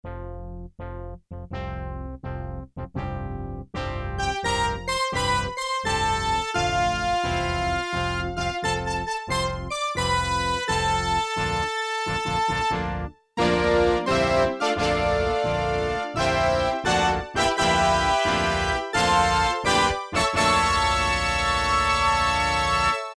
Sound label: ORCH SPLIT, Casio VZ-10M; Internal factory combi